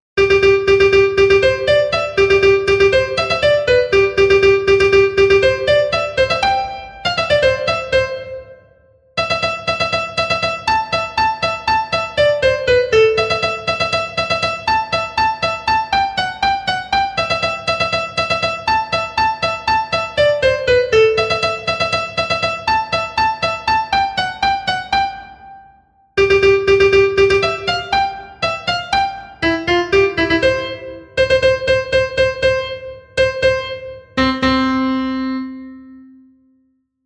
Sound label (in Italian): Fanfara C; Rossini